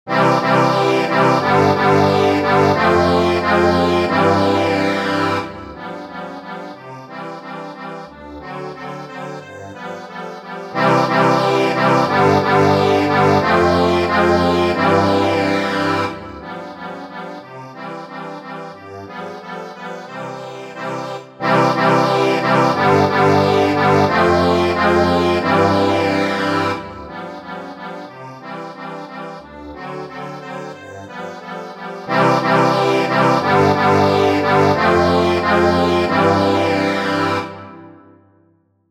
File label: ACCORDION; Entr'acte